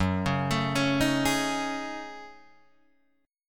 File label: Gb Minor 6th Add 9th Gb down Guitar Drop D